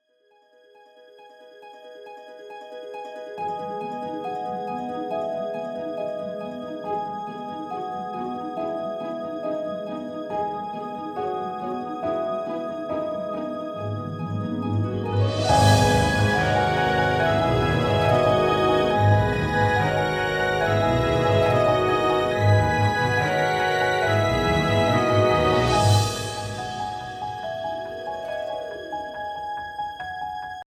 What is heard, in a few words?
Fast, Orchestral, Stressful